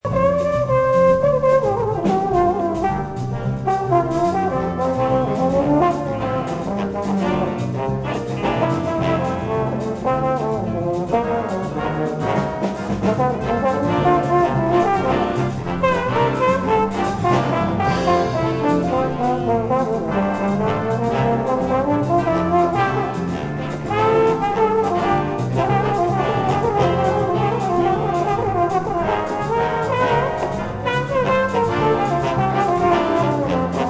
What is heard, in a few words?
samba tbn